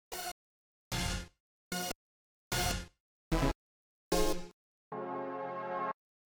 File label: Sampling Synth One Shot